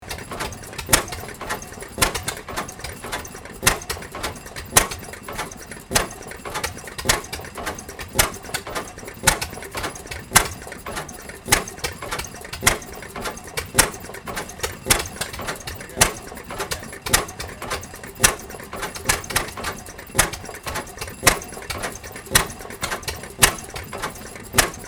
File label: Machine room ambience machinery movement loop